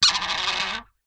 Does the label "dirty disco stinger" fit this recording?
No